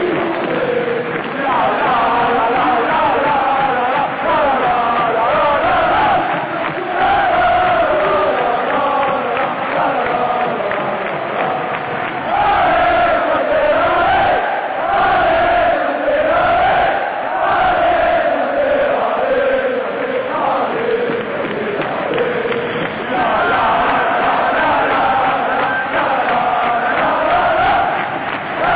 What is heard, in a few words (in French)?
Allez sainte allez, chant